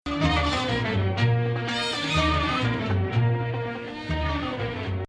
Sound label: Sikah Beladi